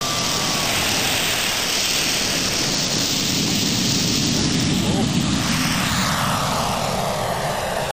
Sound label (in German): Flug Start